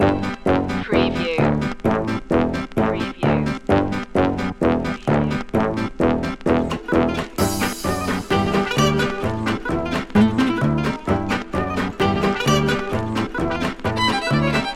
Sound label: doo wop bop loop